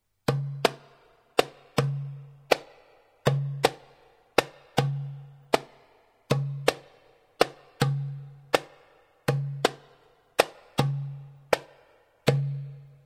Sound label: darbuka; notations